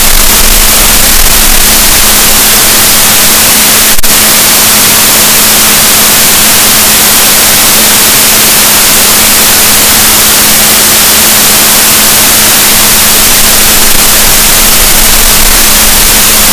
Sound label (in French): rorquals positif, gaussian noise, Balaenoptera Physalus Chile